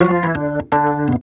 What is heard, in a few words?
Organ Lick; Ambient Grooves; Organs Licks